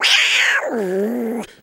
Cat Angry Meow Growl

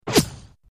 Left To Right Various, bullet bys

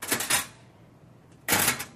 Locker Door Open, Close In School Hallway

